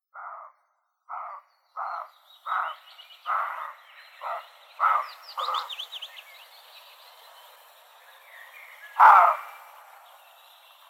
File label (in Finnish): metsakauris